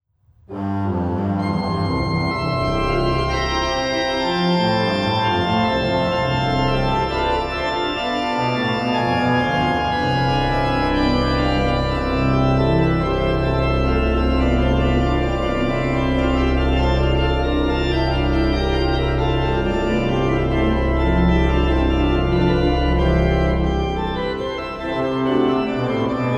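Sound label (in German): Praeludium C-Dur, organ; BWV 545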